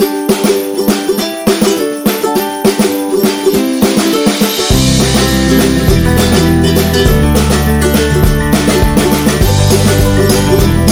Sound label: A Happy, Happy acoustic background music made for smiles, sunshine, and everyday joy